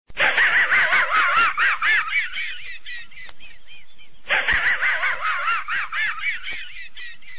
pulgoso la risa

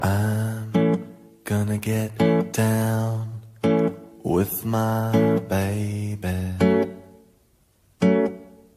romantico

